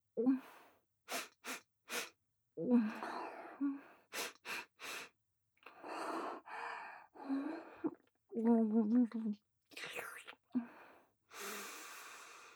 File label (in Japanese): フェラ 普通; マリエッタ